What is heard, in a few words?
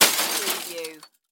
Debris Metal Drop